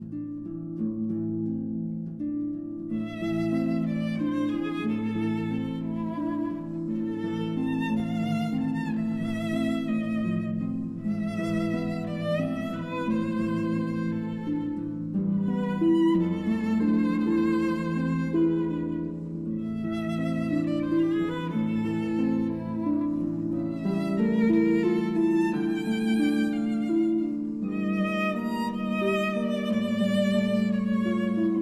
Berceuse, for violin and harp

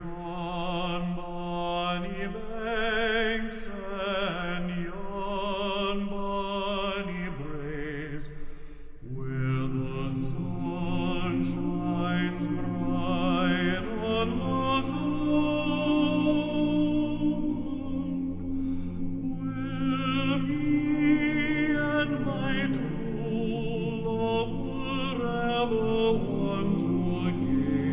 Scottish Folk Song; Traditional (Scottish); Loch Lomond

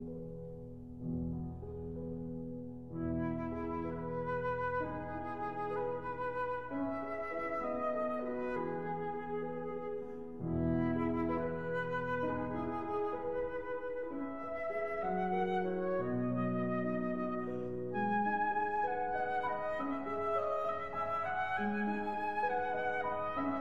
Berceuse (Lullaby) for flute and piano

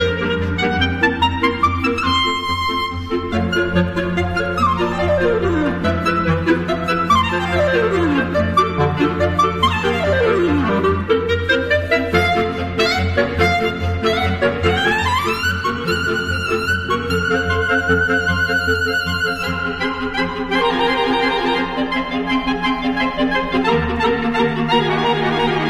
Clarinet Choir - Carmen Suite

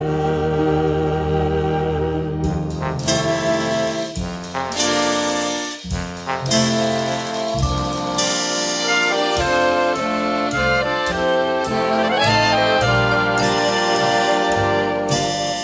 Big Band

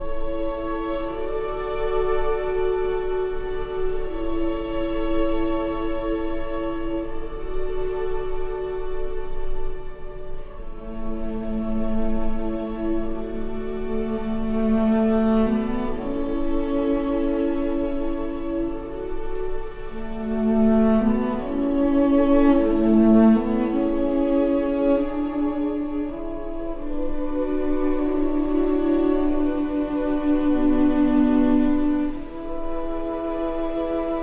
for cello and strings; Archi di Santa Cecilia

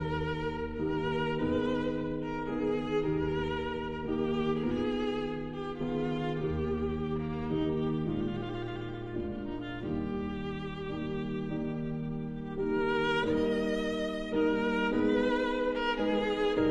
Sicilienne for violin and piano

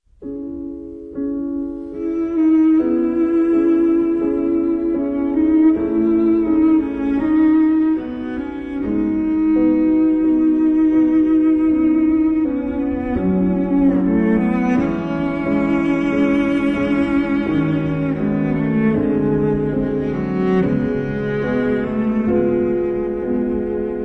piano, Vocalise; Opus 34, No. 14

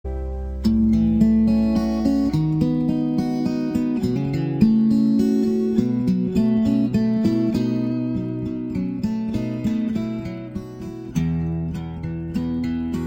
Hawaiian Slack Key Guitar, Away In A Manger

